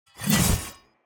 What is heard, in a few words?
vampire strike; Shelter